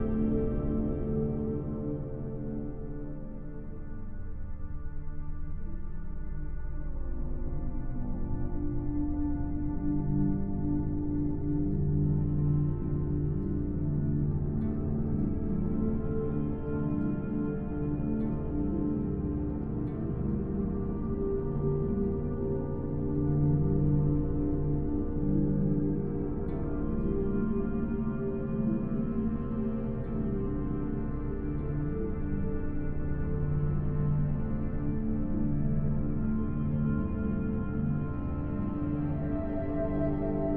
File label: score Graveyard; Journey